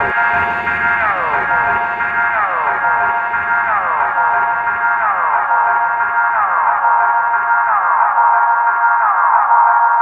Jazz Noise; Sonic Foundry (Sony Creative Software); Leo Cavallo Bass Taster; Special Fx

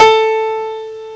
PIANO H, PIANO; CMI Sound Library Rev 1.3

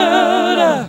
DER DER; AMG

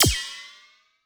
Korg 01W MegaDrums; 300 Drum Machines

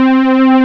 JUNO STR; E-MU Formula 4000 Series Vol. 3 – Analog Odyssey; Vintage Keys

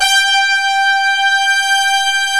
SYN BRS & CHOR

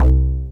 WOODY BASS; AMG - Global Trance Mission VOL-2; SYNTH

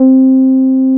TB-303

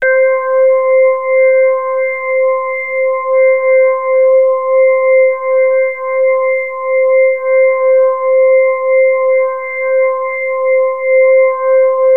Access Virus B; Synthworx

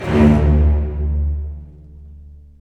STR, Full Short; Roland - LA Composer vol. 4

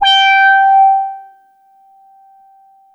G6, Boom da Bass; E-MU Producer Series Vol. 6 – Analogia Project (CD 1)

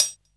HH, HIHAT; 90 s Sample CDs; Best Service Dance Mega Drums

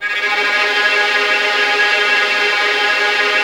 VS G#3 tremolo, Violins, String Ensembles; Miroslav Vitous; 11 Violins

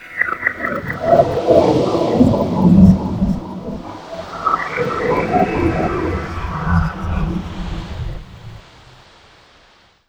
WAVES, Water; USB Soundscan vol.33; AKAI; Disjointed Textures

